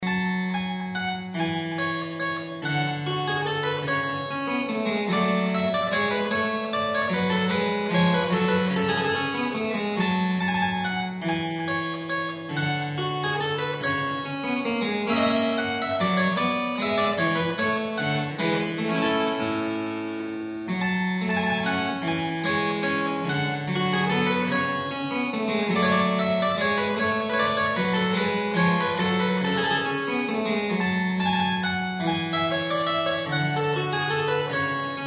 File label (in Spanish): Menuet Sol M, clave